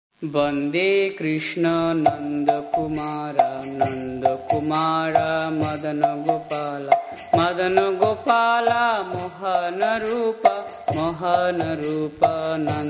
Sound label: vande krishna, Gaudiya Kirtana; bhakti